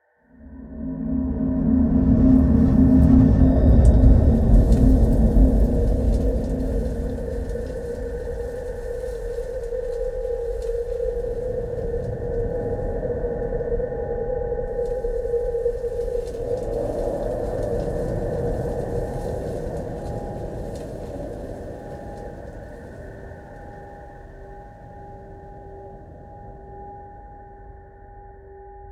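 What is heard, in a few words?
maintenance ambience; civ13; civ14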